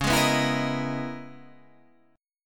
Db Minor 13th, chord; Dbm13 strummed; JGuitar

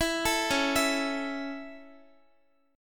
C sharp Minor Sharp 5th down Guitar; C#m#5; arpeggiated

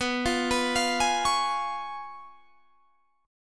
Db 7th Cb, guitar; Db7/Cb arpeggiated

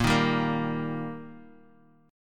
D sharp Major A sharp, chord

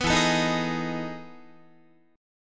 E Major 11th E, Guitar; strummed

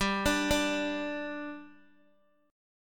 G 5th G Guitar, chord; G5 arpeggiated

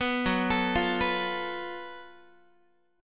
F sharp Minor Major 7th Double Flat 5th, Guitar; arpeggiated; 14,14,12,15,15,x

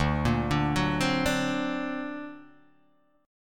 D Augmented 7th D down Guitar Open D

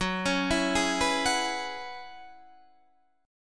Gb 9th Suspended 4th Gb down Guitar Open D, chord

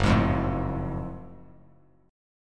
F sharp Minor Major 9th, Bass Standard; strummed; 2,11,3,2; down

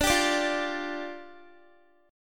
D Minor Double Flat 5th, down, Guitar; Dmbb5; strummed

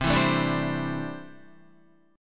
C 6th Add 9th C down Guitar; C6add9 strummed

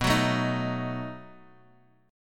B 7th B, Guitar, Open G

